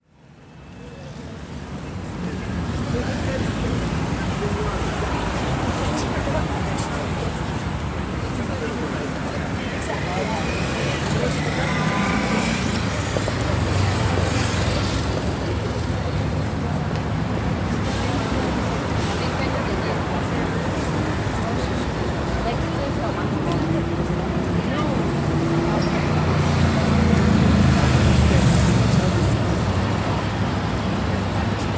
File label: big ben chimes four o'clock